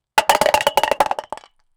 throwing a crab into a, kitchen

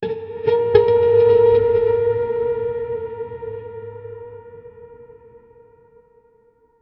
puppet string tension

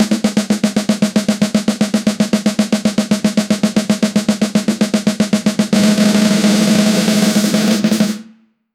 a snare drum roll

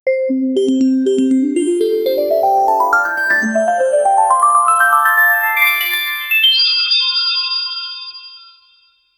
quick ascending chime; sequence

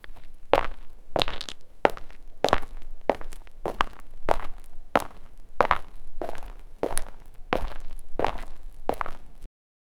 footsteps on marble, surface

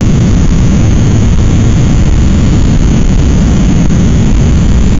rocket engine, launch

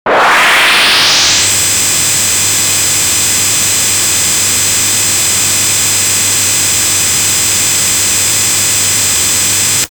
beam attack charging soun; charge

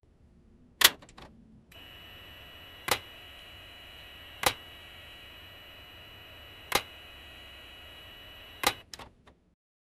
machine gun

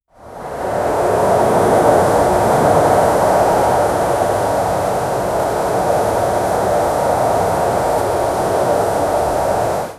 tank firing echoing in th, mountain

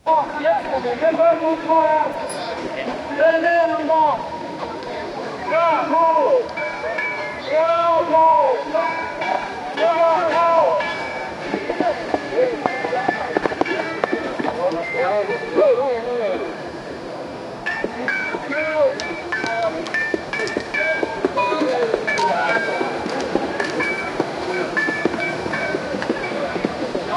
busy street in uptown new, people talking

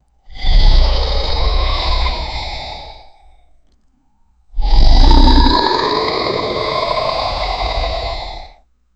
dragon roar

